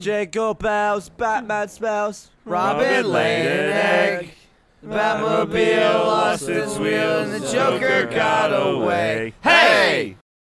Jingle Bells

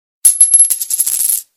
Lucky Coin

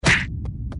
Slap Sound Sms; Lbp

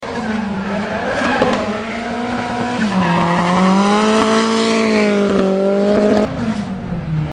Drift Tone Sms

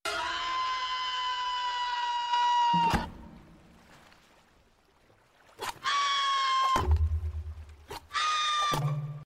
Hei Hei Scream

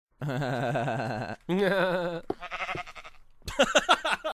Haha-haha-haha-haha

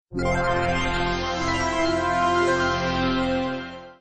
Jingle; Aperture

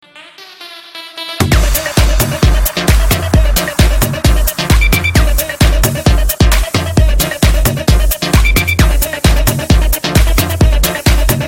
Strong Beat

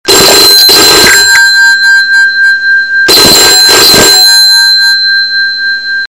Old telephone

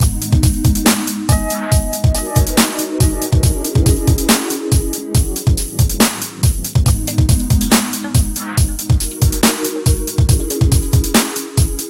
Nighttime Dub

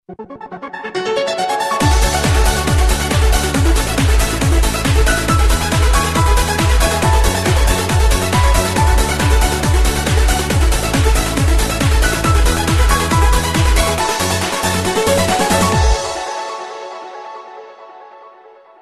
Trance Tone Mix

